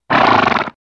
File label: Horse Snort Longer